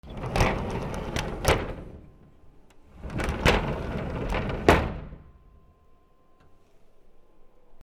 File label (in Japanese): 引き戸, 『シュルル』; K｜フォーリー(開閉); K05 ｜ドア(扉)